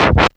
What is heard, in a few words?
Scratch, Fairlight CMI IIe